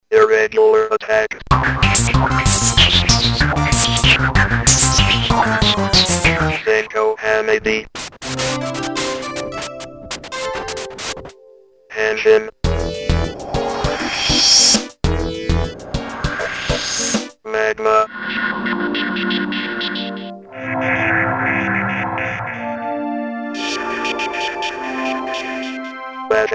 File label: FX2 4op